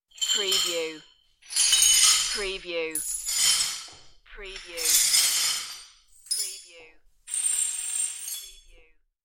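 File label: Swiping Glass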